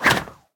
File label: cannon; Crash the Bash - Drink Break